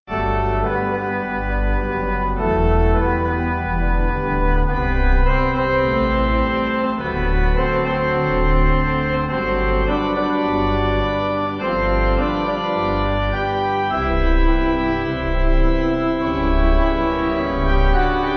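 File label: Pipe, Pipe Organ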